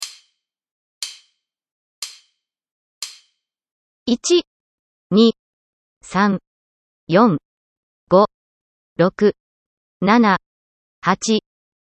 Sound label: voicetraining a